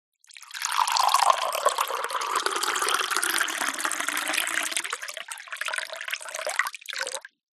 boiling water pour from kettle into cup slow speed; food drink; zapsplat